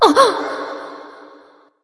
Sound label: surprised light; smite; voicepack; hel